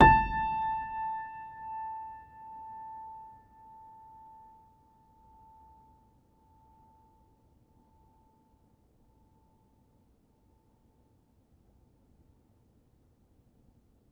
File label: Player, Upright Piano; HSS OP Pack; healing soundscapes